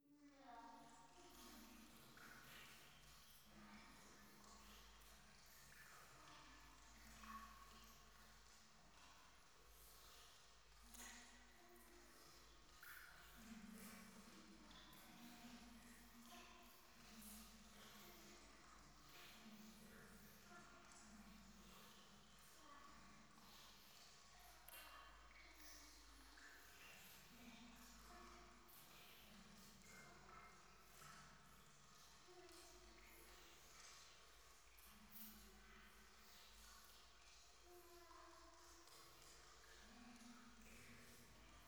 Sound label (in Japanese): フェラチオ 雨上がり; 夢の中